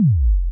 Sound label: kickdrum